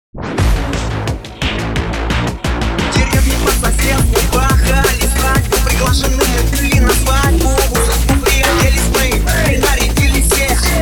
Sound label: mix DNB